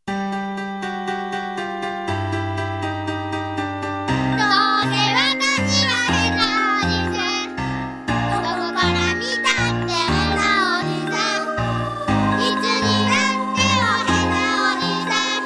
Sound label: solo